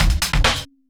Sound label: drums, Trancefunk 134 F#min; Big Fish Audio; Hardcore Breakbeat Action; Trancefunk Mutator